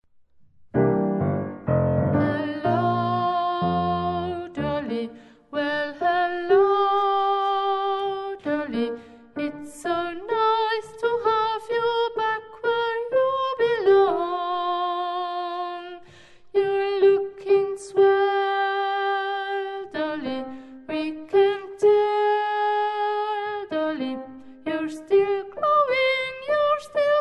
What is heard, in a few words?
Sopran 2